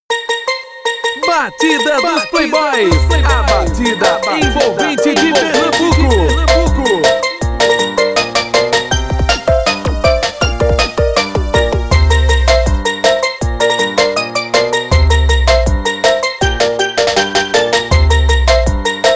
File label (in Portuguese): base skol beats